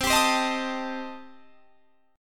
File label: C Minor 6th, chord